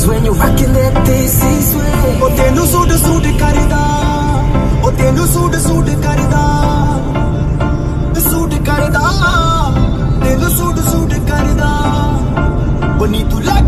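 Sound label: background music Ringtone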